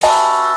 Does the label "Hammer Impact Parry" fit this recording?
No